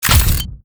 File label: Bottom Click, UI sounds; Custom UI Sounds; Call of Duty Black Ops Cold War